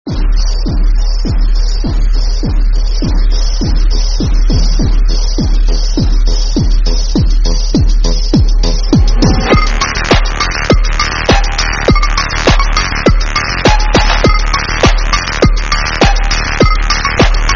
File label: microwave reverb